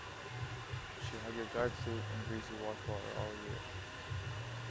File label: mix Cafe